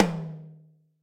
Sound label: roomy tom drum single hit d sharp key